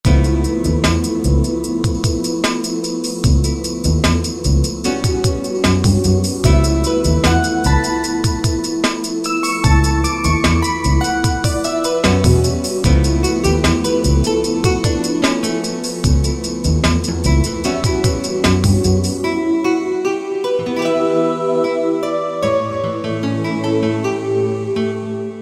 jam Gtr & Choir, E-mu PK-6

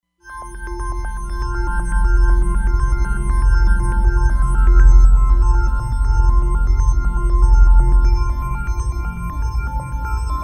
Roland Fantom XR; Orbiting

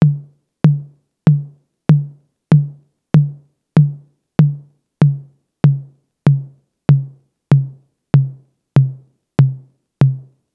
TR-808 Mid Tom; Roland; Instrument samples

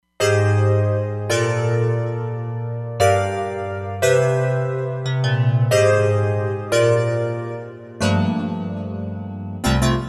FM-777, Roland Fantom XR

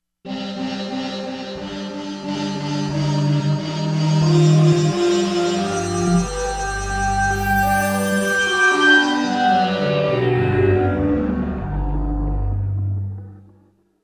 Dry Delay, Korg MSC-13S Ethnic; Korg MSC-13S Ethnic 2

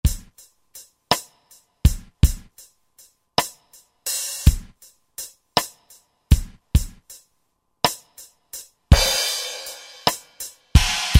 Cntry Oldy Set; Country; Roland SR-JV80-17 Country Collection

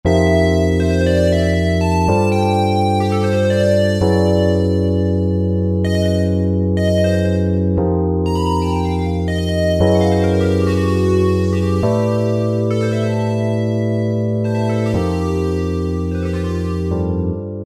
Jazz Hands, Roland SR-JV80-19 House Collection

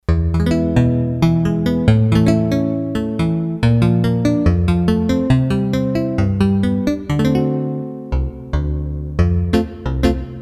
Roland D-10 Pick Guitar; Factory Patches

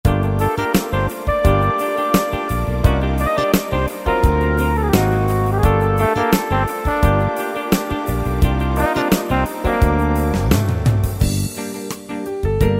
R & B Ballad, Yamaha PSR-3000; Factory Styles

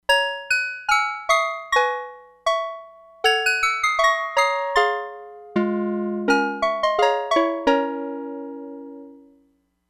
DIGITAL BELL HIGH, Crumar Bit 99; Factory Programs

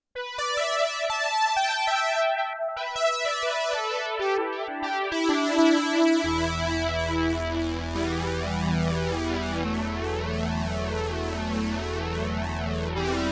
SYNTH; Factory

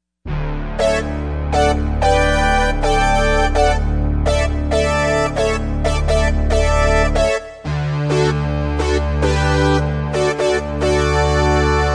Synth Brass, Yamaha TG55; Factory Presets

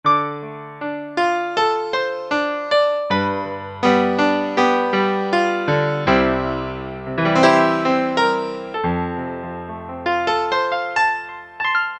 Stereo Piano, E-mu Proteus-1; Presets

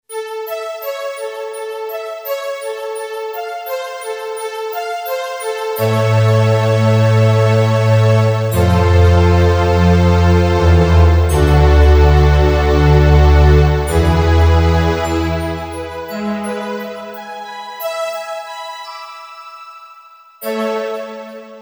Golden Age, Yamaha PSR-550 voice

